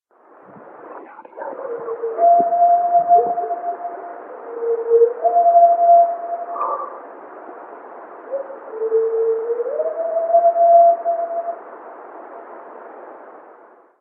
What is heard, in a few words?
Singing Howls; Michigan; Isabella County